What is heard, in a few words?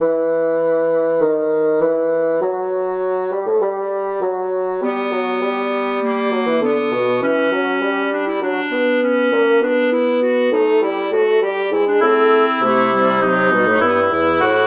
Small fugue for wind synth; Oboe, clarinet, bassoon